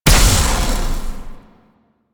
Survival Orb Destroy; Warframe; Zariman

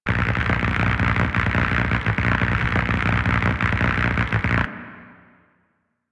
Infested Arc Rifle Active Loop; Warframe; Weapons

